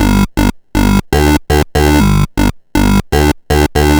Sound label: Sh09 Bass Loop, 120BPM